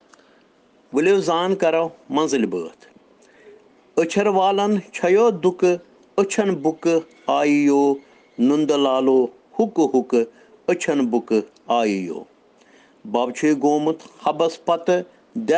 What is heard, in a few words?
Children Rhyms in Kashmiri